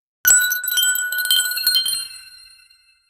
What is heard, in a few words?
fantasy coin drop sound